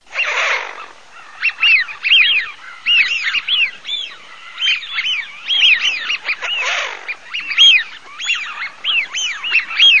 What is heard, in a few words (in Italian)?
FISCHIONI